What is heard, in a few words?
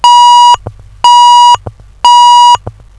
tone